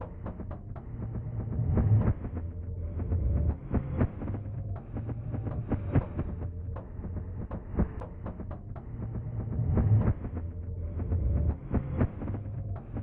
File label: bgm; Dotflow